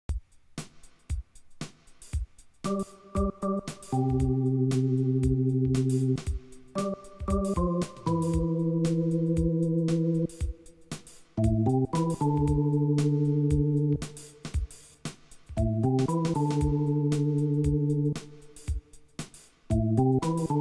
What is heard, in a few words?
happy day basse